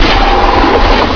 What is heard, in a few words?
dodge; matrix hl